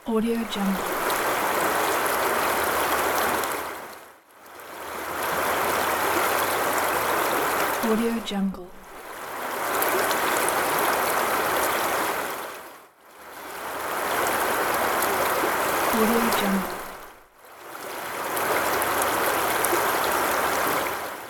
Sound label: large calm water waves